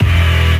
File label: fun, Stabs